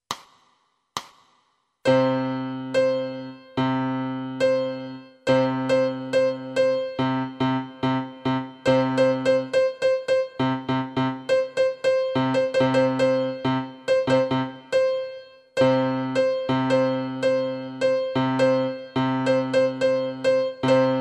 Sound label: piano